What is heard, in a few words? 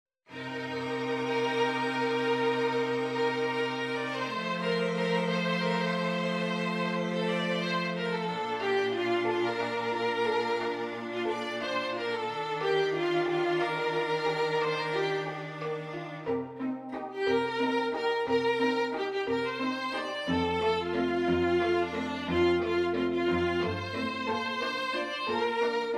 STRING QUINTET